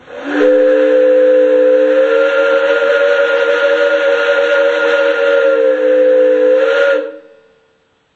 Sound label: Whist